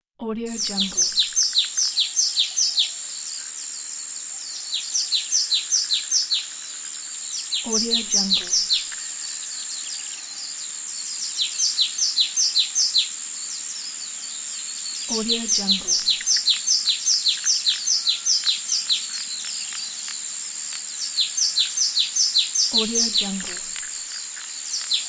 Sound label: songbirds early morning